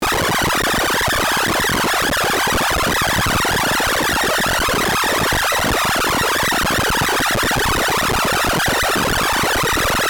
Saw random fast old computer calcul

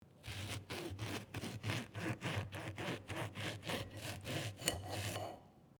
knife cut, foley

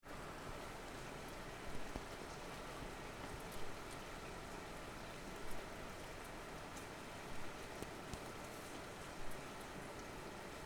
Rain out of window thunder; atmosphere

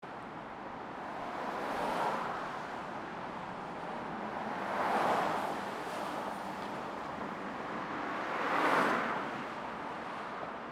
car pass highway; spoteffects

